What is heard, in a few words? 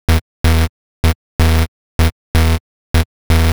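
percussion, Drum Loops 126bpm, Nu Dance Electro; Zero-G; Soundclash Nu Dance Electro; Acidized Wavs; Dubnobass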